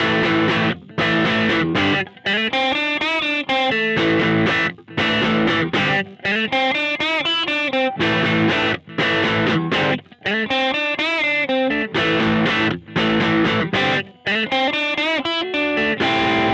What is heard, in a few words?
Overdriven Vibro